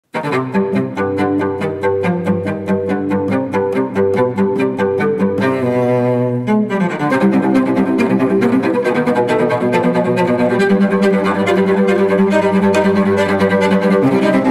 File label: Cello; Wednesday Addams